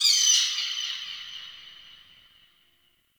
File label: Forest Bird High, Ambience; Warframe; Grineer